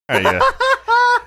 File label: cute laugh